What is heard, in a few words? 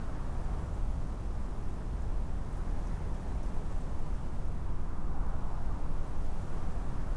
amb Open Hallway; theed; Star Wars Obi-Wan; XBOX